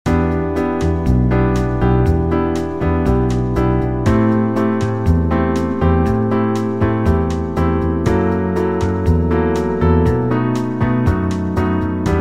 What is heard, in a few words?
beige bossa